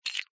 sport whistle metal movement hand short

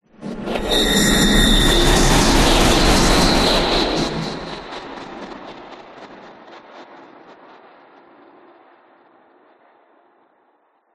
whoosh; orlando lanzini

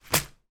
wallet with coins inside put down on table

